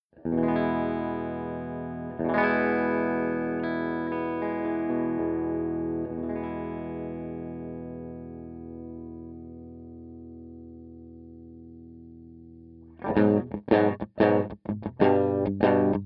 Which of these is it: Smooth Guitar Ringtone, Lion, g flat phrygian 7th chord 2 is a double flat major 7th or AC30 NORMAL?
AC30 NORMAL